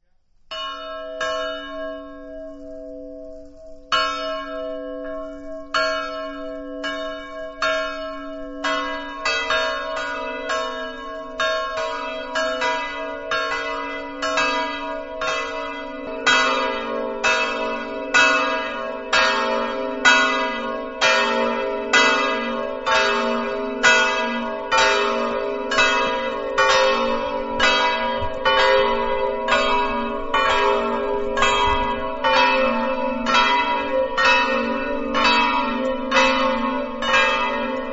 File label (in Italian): campane; icinins